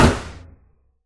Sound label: bullet impact wood